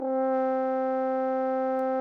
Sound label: Instrument